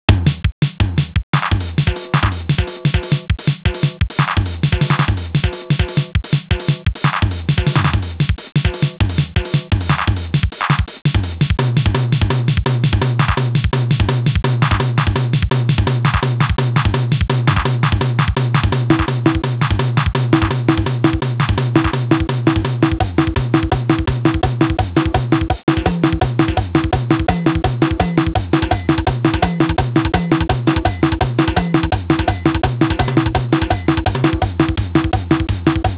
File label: drum